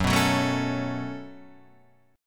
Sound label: C sharp 7th Suspended 4th Sharp 5th F sharp